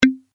release Magnet; faradays law

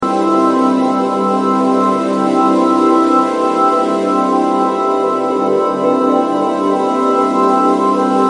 moving In Proportion Organ Loop

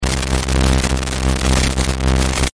electric Discharge; john travoltage

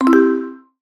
Mass Selection

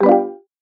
collect sound; mean share and balance

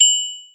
precipitate; molarity

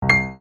projectile Type Piano; projectile data lab

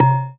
glass Level Sound; mean share and balance

